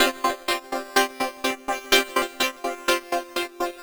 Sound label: Synths; Underground UK House & Electro; Sonic Foundry (Sony Creative Software)